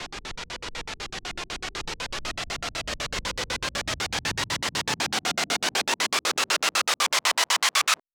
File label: Uplifter F; 99Sounds x Monosounds